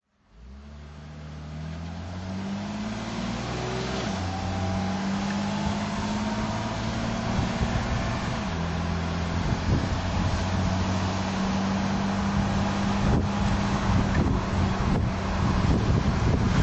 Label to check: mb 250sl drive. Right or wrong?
Right